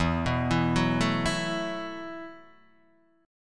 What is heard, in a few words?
E Suspended 2nd Suspended 4th E, Guitar, Open D; 2,2,2,0,0,2; down